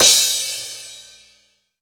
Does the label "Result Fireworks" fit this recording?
No